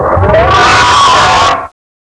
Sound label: scream